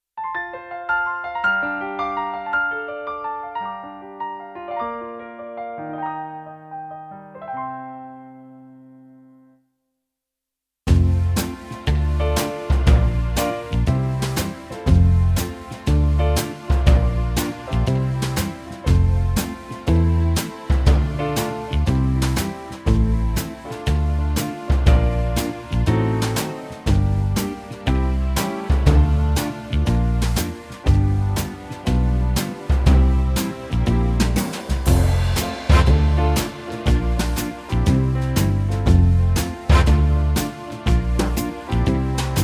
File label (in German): vollinstrumentiert Playback F-Dur